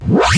Electro FX; Ueb HE Electro FX

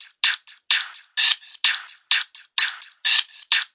hatlike loop wet; Ze Franks Pain Pack

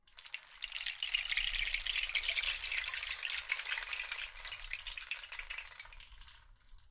rain stick delicate; ETHNIC and WORLD PERCUSSION; Rainsticks

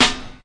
verby snare; single hits

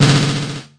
pearl kit snare roll; REAL LIVE KITS

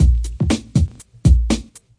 gentle hiphop, drum loops and breaks, 120 bpm

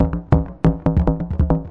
140 major4, synth and electronic loops

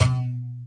marimbula hi; Marimbura; WORLD

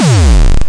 obnoxious kick

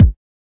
kick

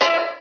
vintage cartoon boink; Cartoon Sound Effects Kit

